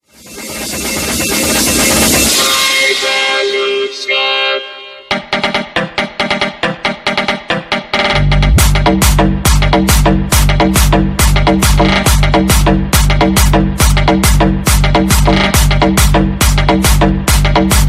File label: Tribal Dance